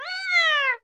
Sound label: felid